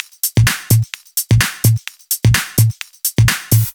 Loop, 128 BPM

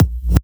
Kick

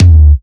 tom, One-Shot Drums; Dubstep; Rimshots & Toms

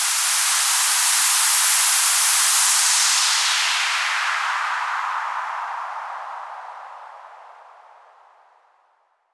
FX Downlifter

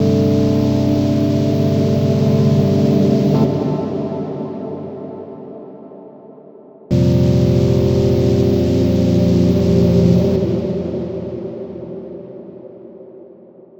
Bpm139 Pad Loop; Airy; Aeros; Prime Loops; Dubstep Illusions

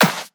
Claps & Snares

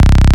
Bass Shot C

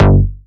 Bass Shot G

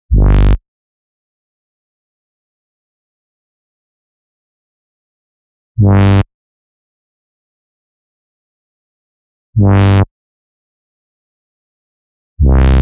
127 Gm Bass, Complex Electro Synths; Prime Loops; Shoxx

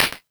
Clap, Dubstep One-Shot Drums

